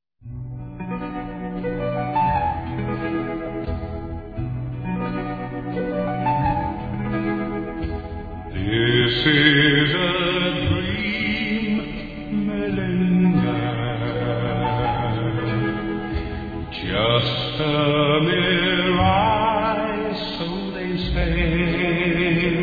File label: Waltz, 29 bpm